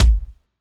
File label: Kick, Synthwave, Drum Samples; HorizonHaze; Futurism; Synthwave Loops